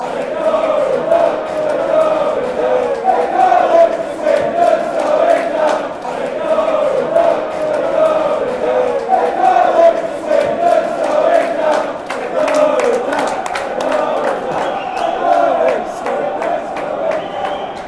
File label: swindons going down, fanchants